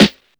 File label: tight low end steel snare drum sound g sharp key